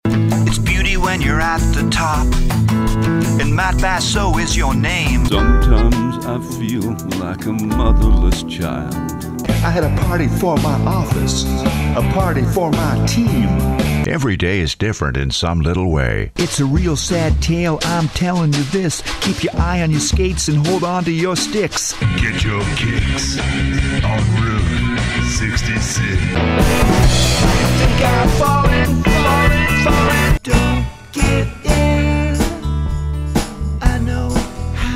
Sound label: Singing